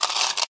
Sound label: Coin Count; Prison Architect